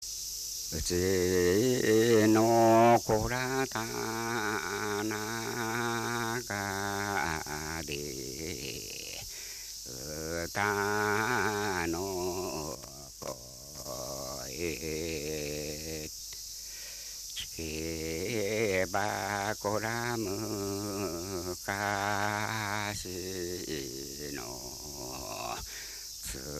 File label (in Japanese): taueuta, minyou